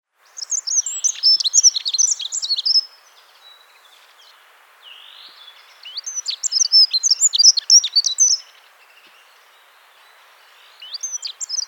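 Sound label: dunnock voice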